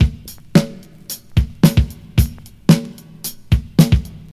111 bpm drum loop c key, Free drum loop sample - kick tuned to the C note. Loudest frequency: 1172Hz